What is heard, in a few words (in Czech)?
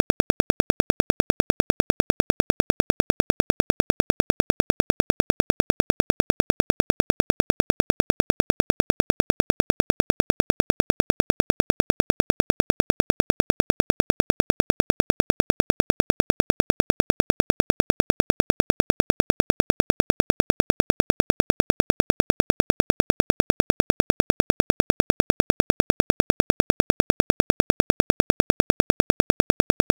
Klik; ORL-přednášky, semináře